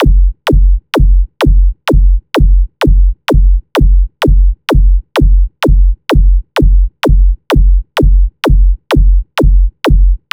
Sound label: Beat Heel Shoe 128 bpm